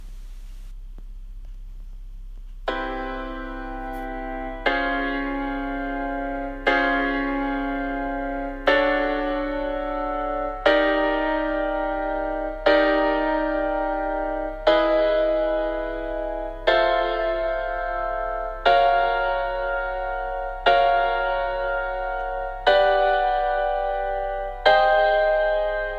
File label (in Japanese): メジャー・セブンス・コード音源